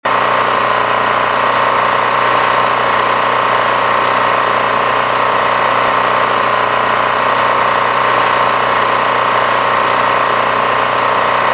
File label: blues steady